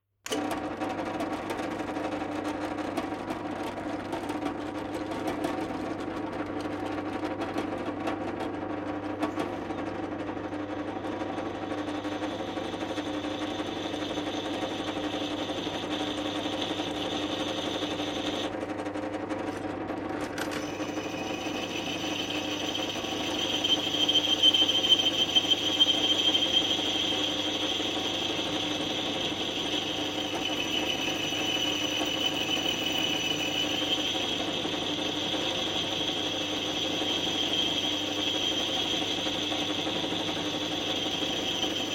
Industrial Metal Bench Drill On Run Drilling Into Metal Off Mechanical Electric Rattling; High Pitche; Tom Hutchings